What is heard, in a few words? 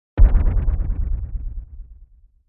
Impact Lfe Elect Shim